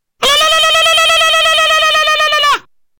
Alalalalalal

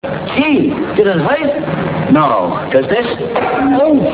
hurt

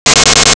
robot; svencoop; breakfree3

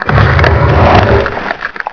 grue munch; svencoop

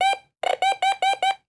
scan

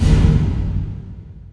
robo beat

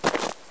dirt; svencoop

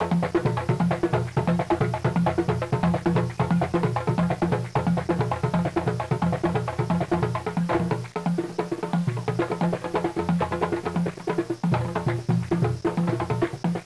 drums

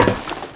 step

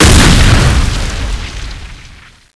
law explode, weapons; cstrike

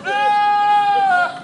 argh; svencoop; sank

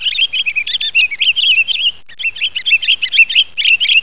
grosbeak; svencoop

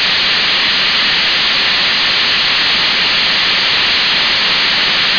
darth static repeating

